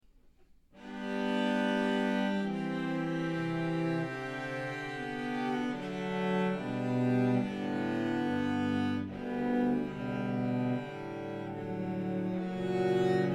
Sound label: Cello Choir Etude